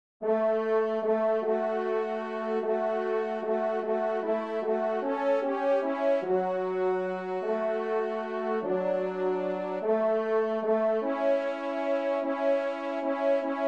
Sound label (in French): Kyrie TRP; Devert; Kyrie 1e Messe